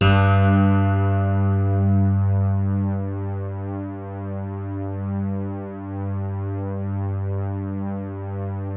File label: BALLAD G1, E-PIANO; Music Store - Claudius Diemeter Schwerlos